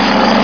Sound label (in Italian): tank muovi, motori